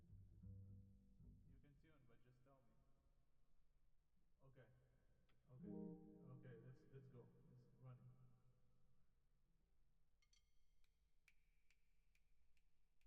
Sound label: Drums Verb